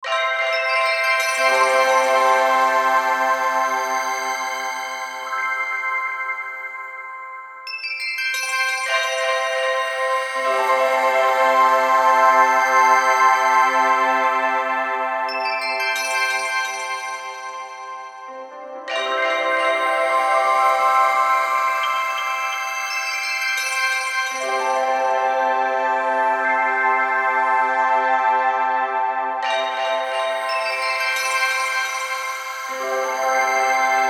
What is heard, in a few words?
Bed Bells Piano; Bed Sound Effects; Blastwave; Production Element Imaging Element Accent Transition